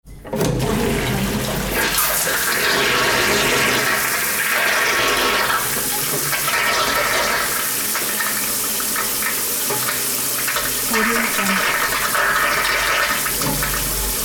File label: toilet flush